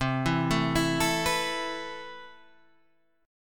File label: A Suspended 2nd C; arpeggiated